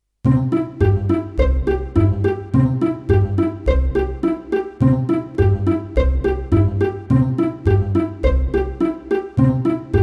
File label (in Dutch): strijkorkest zonder zang